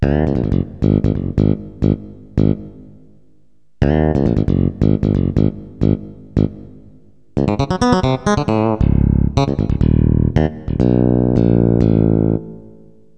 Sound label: ebass; Roland - An Invitation To The Roland World Of Music